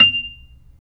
B-3, Percussion; Keyboards of The 60's and 70's

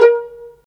STR PIZ VL, String Sections, Violins Pizz

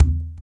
EQ Perc, Percussion Mix

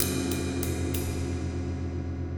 Wind Gong Cymbal Brushes, Ambient Metals; Sonic Foundry (Sony Creative Software) - Ambient Atmospheres & Rhythms

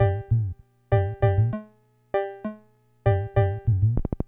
Loop, ct-24; classic machines

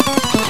Helicopter, SNES; Revolution X; NON Helicopter Pursuit

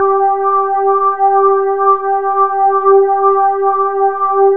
MKS80, PADS; Masterbits; Vintage Voltage

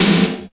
CYBER KIT, DRUMS

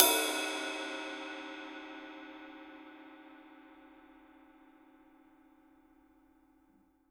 CYM, Real Drum Kit; REAL DRUMS; ROCK KIT

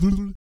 VOC PERC, BASS PERC; ILIO; Vocal Planet VOL-3; Jazz & FX

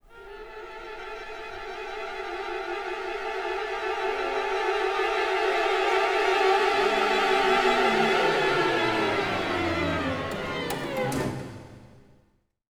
Orchestral Loops STRING ACCENT

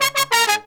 Pop & Funk Brass

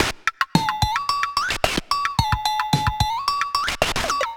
Bent Korg MR-16 (110BPM), cyborg beats

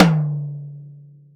velocity tom; VELOCITY TOM 1

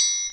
triangle, Percussion; InVision Interactive; Percussion Slam

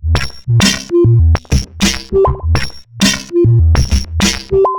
Robo Beat 100BPM, sci-fi Beats

